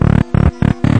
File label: darkbass stutters; Basses